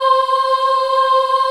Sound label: C4 CHI OH -R, Choir; USB Soundscan vol.28; Acoustic & Synth; AKAI